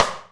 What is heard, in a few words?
sharp steel snare drum sound c key